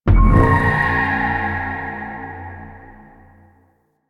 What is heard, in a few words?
Spawn, Vehicles; Zariman Liset; Golden Instinct; Liset Powers